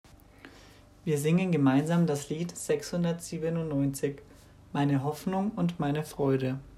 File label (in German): Lied